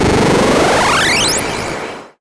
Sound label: FX Uplifter